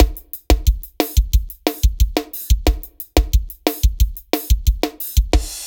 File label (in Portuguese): LOOP BATERIA 90 BPM